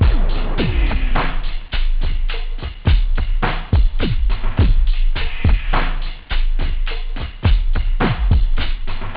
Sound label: break, loop, house